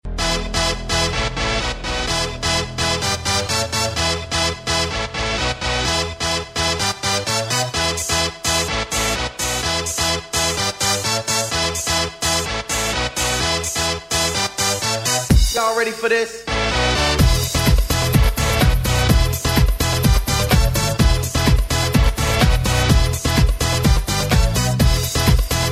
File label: instrumental